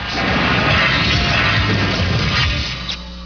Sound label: outro